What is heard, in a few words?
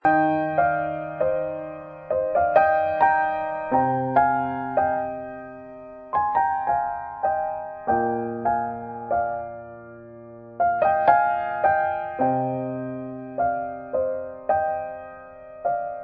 romantic